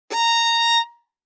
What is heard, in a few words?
Violin arco ff sul E Bb5; MIS Pitches - 2014; Strings